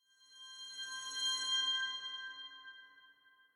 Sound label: Vanish; LOOPERS